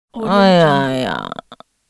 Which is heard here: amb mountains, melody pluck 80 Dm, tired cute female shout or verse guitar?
tired cute female shout